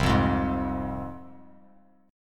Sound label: C Suspended 4th Sharp 5th, C, down, Bass Standard; Csus4#5 strummed; JGuitar